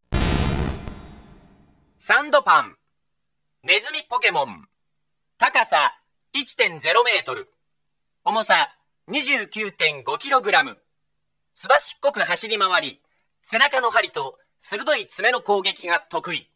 sandslash, Pokemon Gameboy Sound; Pokemon Gameboy Sound Collection

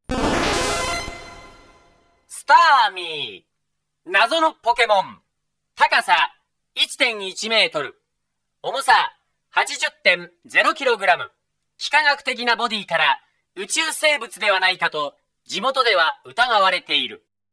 starmie, Pokemon Gameboy Sound; Pokemon Gameboy Sound Collection

